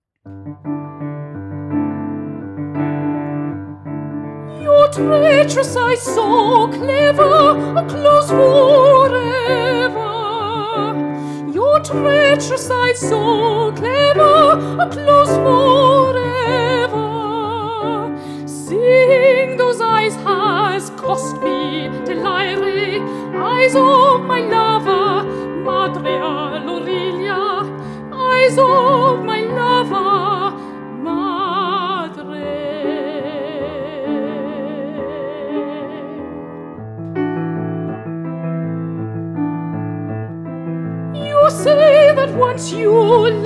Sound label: Song